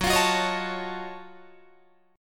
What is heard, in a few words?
F sharp Minor Major 13th, Guitar DADGAD